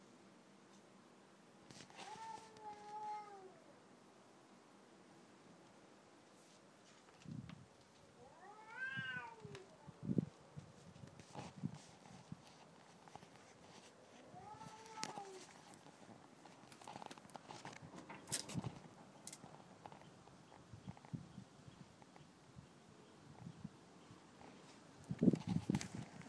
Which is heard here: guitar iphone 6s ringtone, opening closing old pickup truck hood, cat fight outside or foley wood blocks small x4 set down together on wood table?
cat fight outside